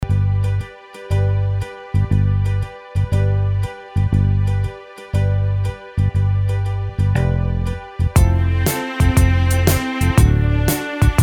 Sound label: Karaoke Song